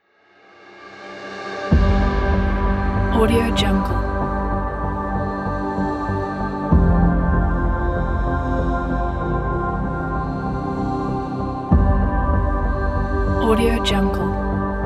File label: atmospheric soundscape dream music pack